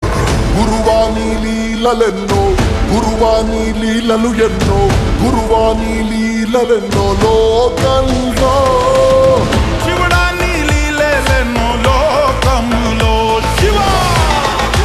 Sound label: Ringtone Slowed Reverb, Telugu